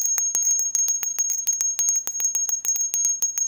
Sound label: bell